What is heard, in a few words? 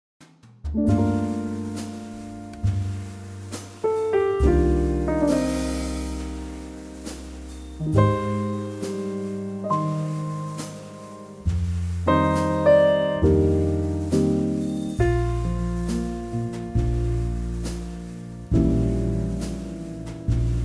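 B flat trio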